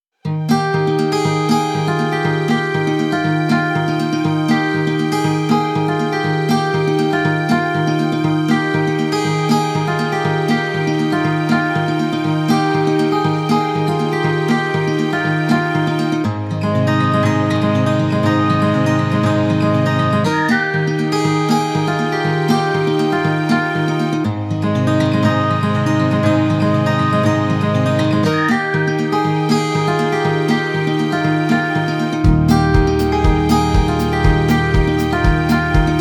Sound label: sweetness whistle; by burak sound bank; cheerful vol 3